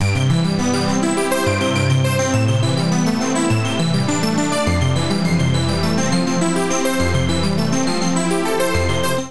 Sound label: Arp A Minor 103 bpm; Accompaniment; Tonal; MIDI Clips; Past Arp; Drive and Glow; Ableton; Factory Packs